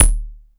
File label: combidrums, techno; single-drums